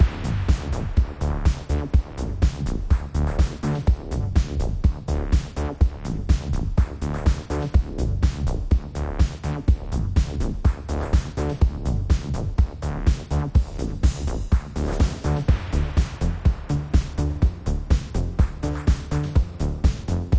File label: Bonus Level Music; Johnny Trigger; Android